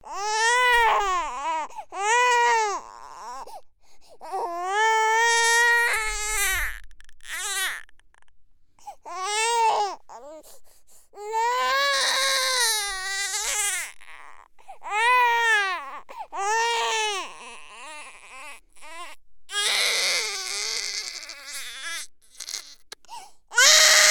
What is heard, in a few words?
Baby Crying